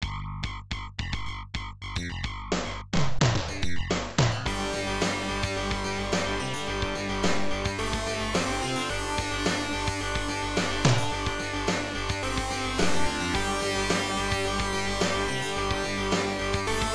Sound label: Quartet (Meets Rock)